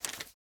Level Editor Panel Select; Aragami

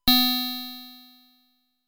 Service Switch Sound; Warp Warp

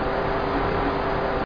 Fan

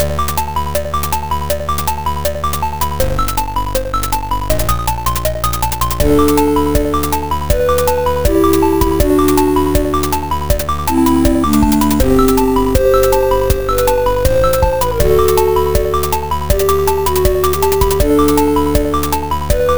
Water Tune; Adventure in the Tower of Flight

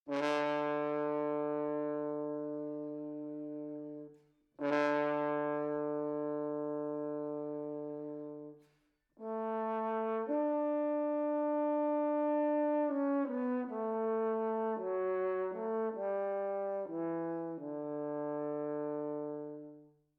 Horn

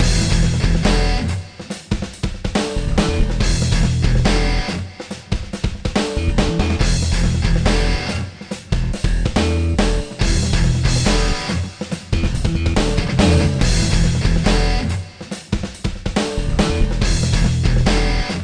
menu Loop Simple; Apache Overkill Minis; PSP

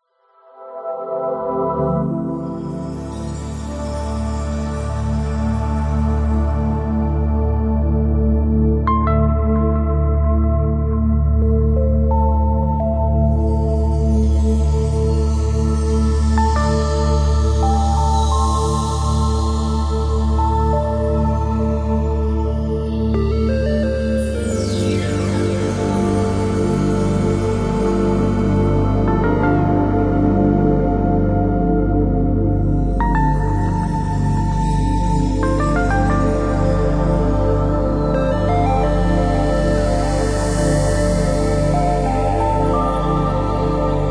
Full Nodrums; Redforest; Epitasis

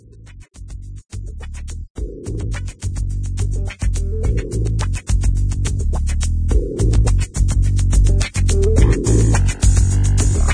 Menu Music

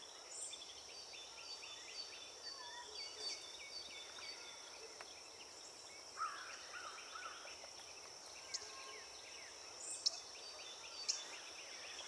Ambience Loop; Mombo

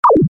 Sound Double Jump; Dream Tower

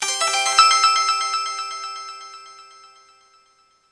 Special & Powerup; dark water slime invader; switch windows

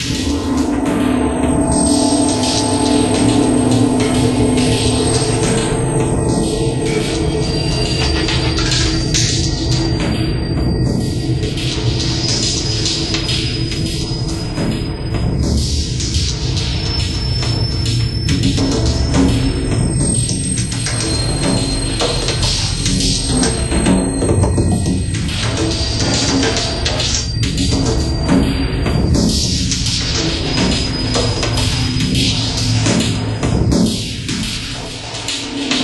Ambient; Hydrophobia

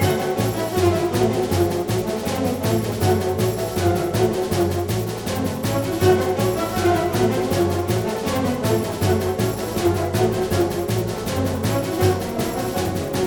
Music Fight Theme Hard Bpm160 Loop; asterix obelix slap them all 2; Brigands

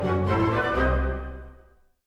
Danger End 64bpm; asterix obelix slap them all 2; Music Dialogue

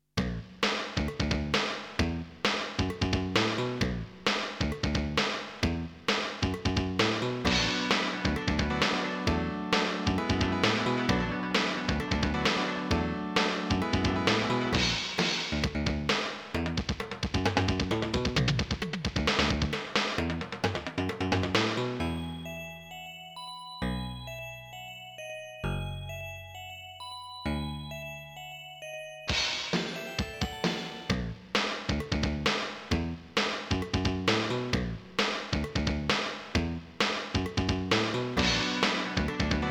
Continue; Genocide; TurboGrafx-16

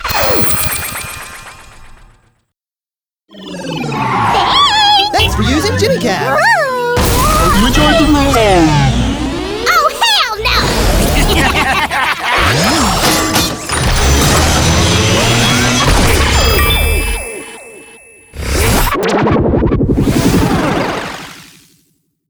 Jetpack Level start; Ms. Splosion Man; Cinema

